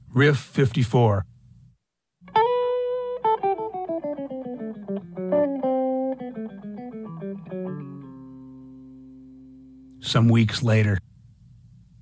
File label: Riff